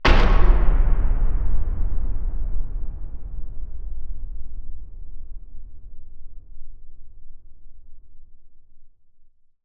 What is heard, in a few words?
Impact Boom Metallic; bottlerocket